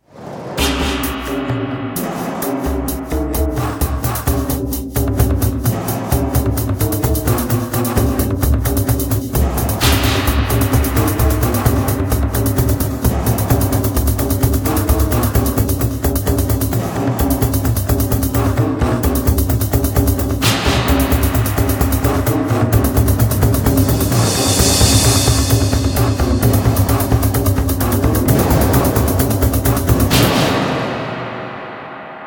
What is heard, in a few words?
Drums